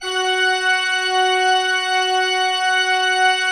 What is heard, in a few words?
F4, cathedral organ; Propeller Island